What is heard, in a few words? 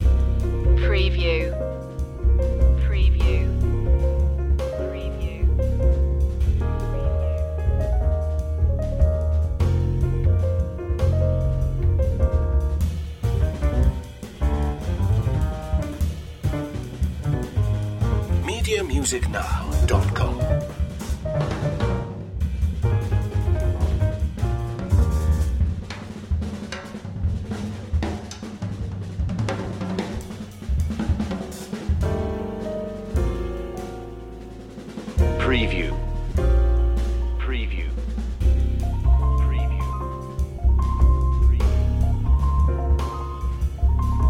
jazz fusion